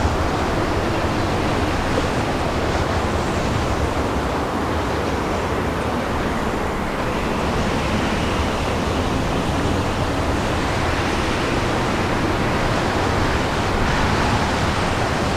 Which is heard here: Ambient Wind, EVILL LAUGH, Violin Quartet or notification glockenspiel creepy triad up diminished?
Ambient Wind